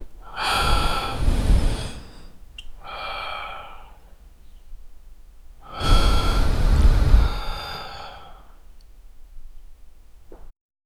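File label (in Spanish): ronquidos de un hombre anciano, elderly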